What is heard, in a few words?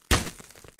Bullet Impact